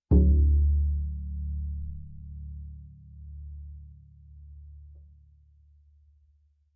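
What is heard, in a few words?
Bass pizzicato fortissimo sul C D1, Double Bass; MIS Pitches - 2014; Strings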